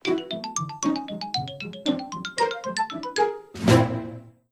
Nintendo Gamecube Default Startup; Nintendo Gamecube (Default) Startup [Phase 2]; Video Game Consoles Startup

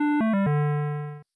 Windows NT Shutdown; Windows Never Released Soundpack 2

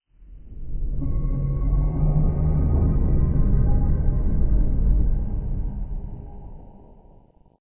Windows Longhorn (Vista Delt) Shutdown; Windows Never Released Soundpack 2

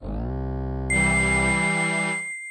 Vine Linux 4.2 Startup

